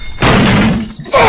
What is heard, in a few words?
smash desk